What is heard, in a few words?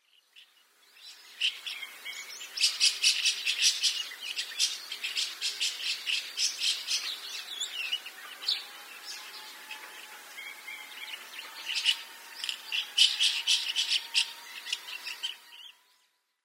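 White-shouldered Starling, Yonaguni; karamukudori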